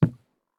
walk wood; Escape from Tarkov

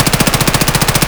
kedr loop indoor close; Escape from Tarkov

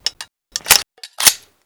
Inf Weapons Foley; Red Orchestra 41-45

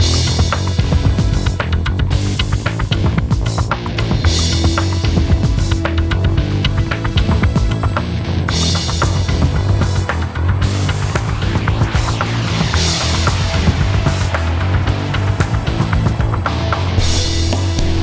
Music Success; DCS

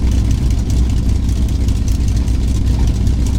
mustang cockpit, engines; War Thunder

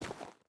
footstep boots dirt walk; Rust

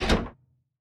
P-51D Gear Tail Lock; DCS

